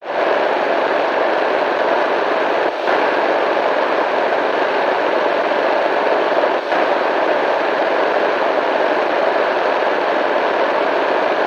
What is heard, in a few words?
radio static pulses every few seconds; technology; zapsplat